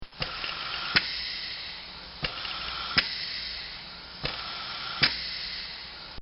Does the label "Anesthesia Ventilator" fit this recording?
Yes